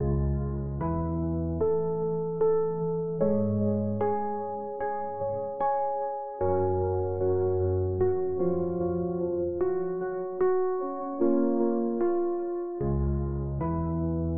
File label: last moments at school, piano, BPM 75; TinyBGM